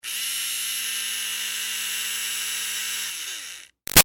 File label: Electric Motor Small Run Med; Motors (Sound Effects:Machines); Big Room Sound